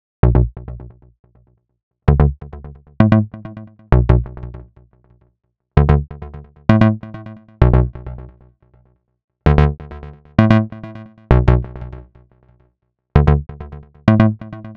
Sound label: Bass, EDM; Sonic Foundry (Sony Creative Software); Afterhours EDM